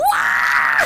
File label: waaaah